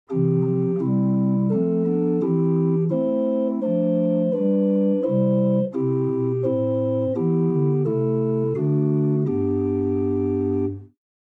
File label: Holzgedeckt 8, organ; Buzard Opus 3